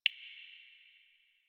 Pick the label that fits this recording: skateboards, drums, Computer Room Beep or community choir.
Computer Room Beep